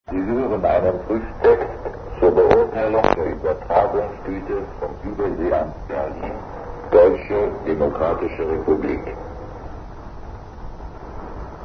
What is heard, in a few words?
ptt berlin; 1981; europe